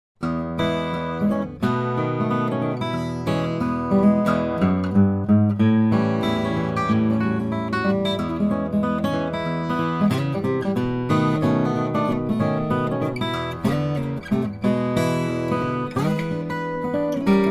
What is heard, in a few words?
posch om, steel-string flattop guitar